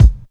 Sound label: fluffy kick drum sound A key; Duration 0:01; Channels Mono; kick drum samples